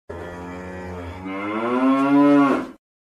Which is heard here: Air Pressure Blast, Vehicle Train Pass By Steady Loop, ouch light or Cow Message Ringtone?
Cow Message Ringtone